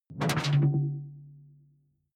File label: sci-fi alien tech noise; swooshes-swishes